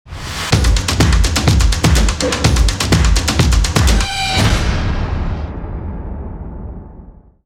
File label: stomp logo; muzaproduction